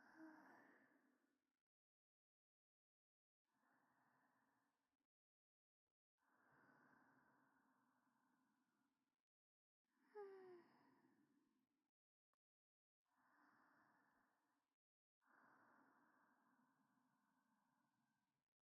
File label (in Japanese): 添い寝ループ（正面）, ASMR